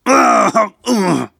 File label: Battle Fall; Zote